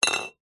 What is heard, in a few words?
bottle 660ml glass beer empty knock over on concrete